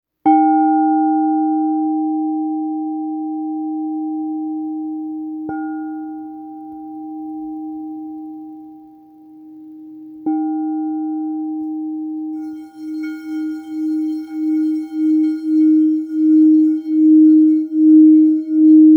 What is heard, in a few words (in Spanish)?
re 156 311 hz, cuencos tibetanos; Rosa